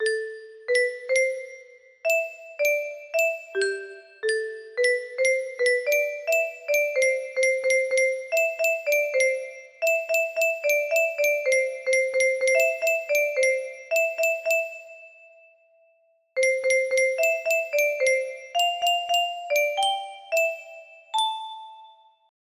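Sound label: theme song, music box melody; gravity falls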